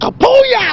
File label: shotgun shoot; KAPOOYA!